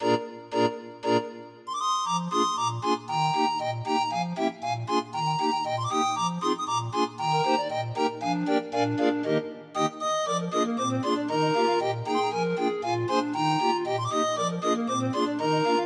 Los Machetes Mexican Folk Song 31 note, grind organ